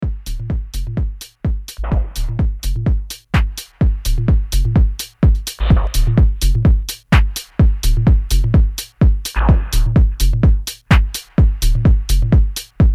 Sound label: Synth